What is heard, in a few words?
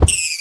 Rabbit Death; minecraft